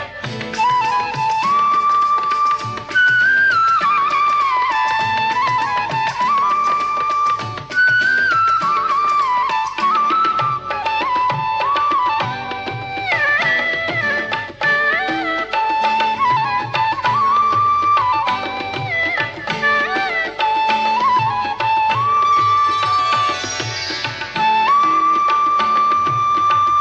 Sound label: shri krishna flute